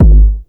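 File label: Dutch kicks, TR808; GM KIT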